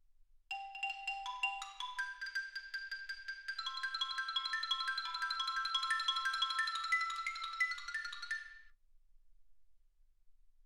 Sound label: xylophone